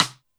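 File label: Snare Big Stick, Alesis D4 drum machine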